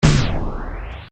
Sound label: Spacedrum